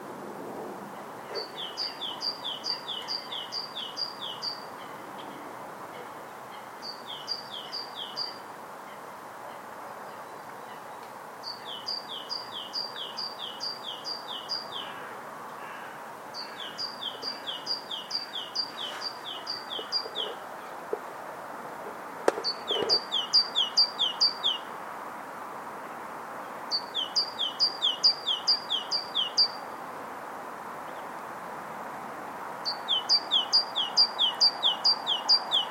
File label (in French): mesange charbonniere